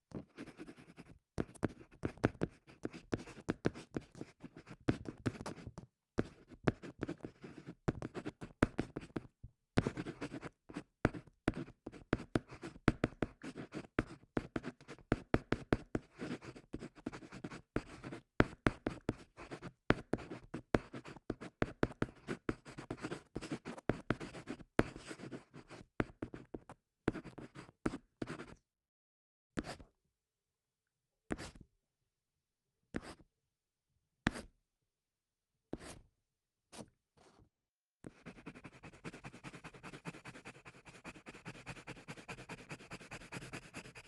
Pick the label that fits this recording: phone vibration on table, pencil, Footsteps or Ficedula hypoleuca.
pencil